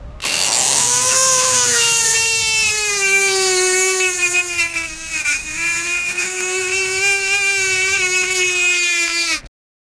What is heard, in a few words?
man making car engine sou; vehicle